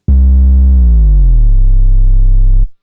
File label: Sub; pitchshifter PSI